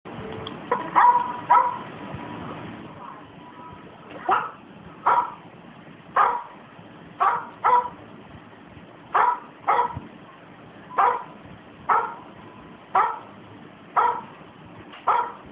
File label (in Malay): bunyi anjing